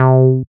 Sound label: SYN TB ORIG, Roland TB-303; Sound & Vision - Gigapack I CD 2